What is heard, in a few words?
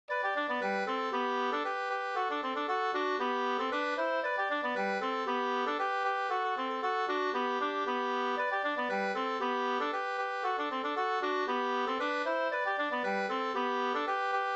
polka; Tjuvagubbarnas polka Hl 2st